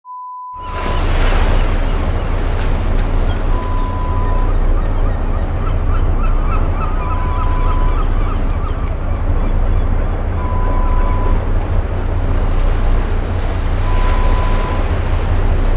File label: Fishing Boat sound